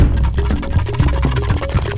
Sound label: Mus Village Build 120; From Dust; PS3; Xbox 360